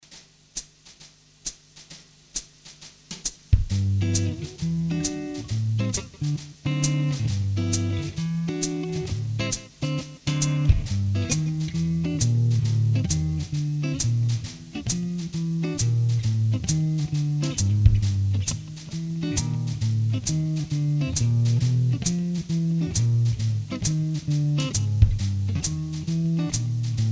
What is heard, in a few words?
Jazz